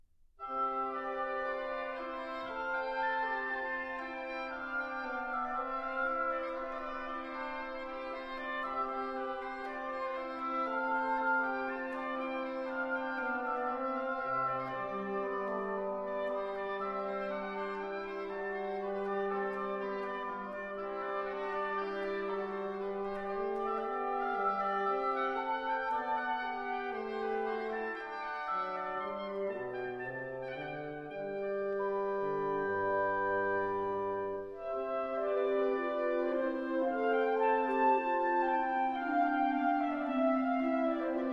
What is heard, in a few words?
pastorale for organ